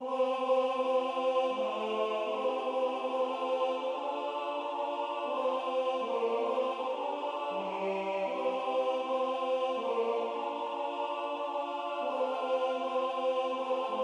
Kein Feuer, keine Kohle Tenor

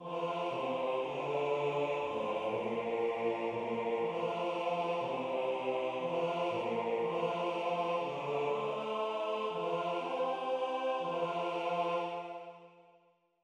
Baryton; Revecy venir du printans Rechant

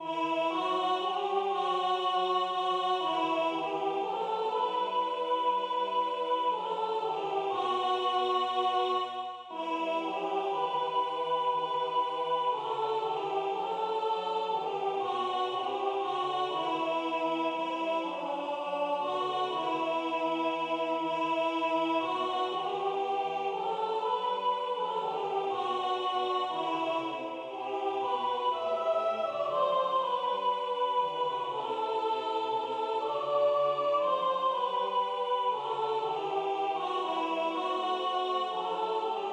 Sopran; Ha mi i di verliebt

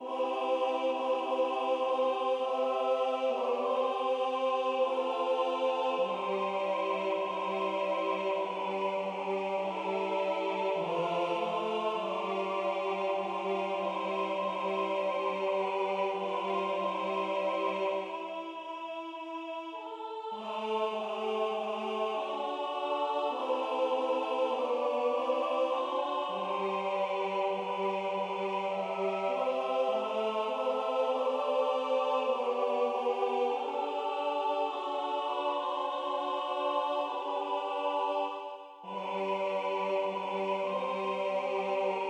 Hebe deine Augen auf Tenor

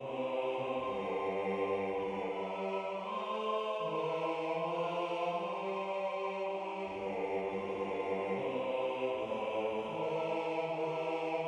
Bass; Der Jäger Abschied

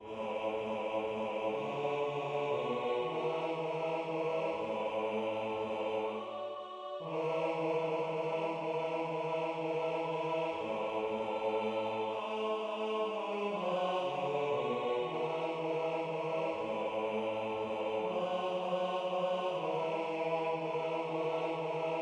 Bass; O bella Bleniesina

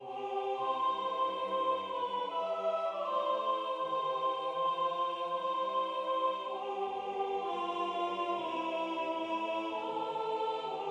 Sopran; Der Jäger Abschied

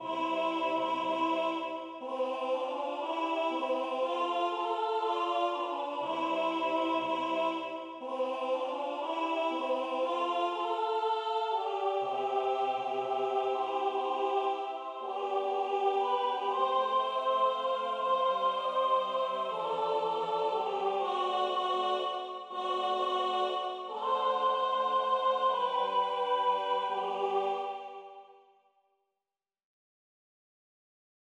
Gloria mit Soli Alt

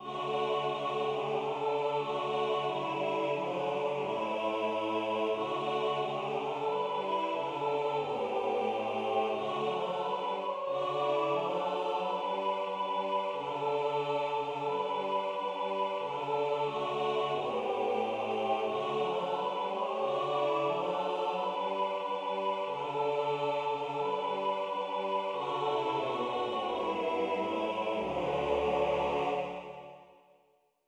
Gfunde Sopran

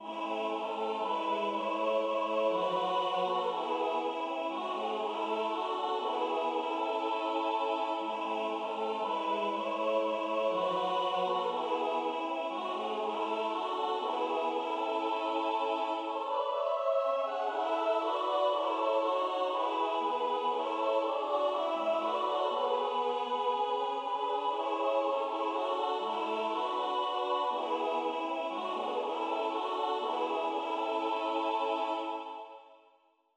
Tenor; Maienfahrt

